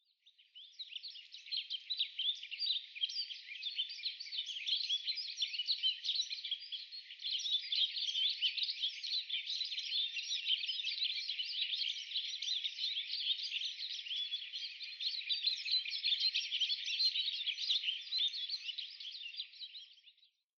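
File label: kashiradaka, Rustic Bunting; utsunomiya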